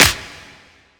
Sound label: clap